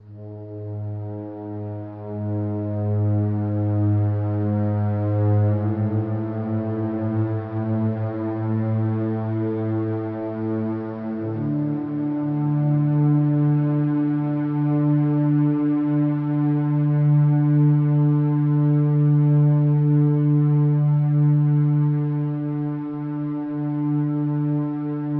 ambient, vulcanus; Factorio - Space Age; Vulcanus interlude 1

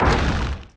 asteroid collision metallic small; Factorio

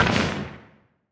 asteroid damage interstellar medium; Factorio

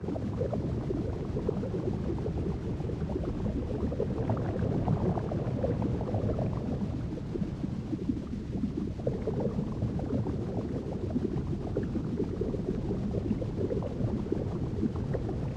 tail rumble; enemies; demolisher; Factorio

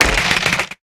mined tree; mining; yumako; Factorio

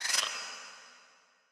night birds; factorio

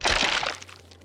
mined, mining; funneltrunk; Factorio

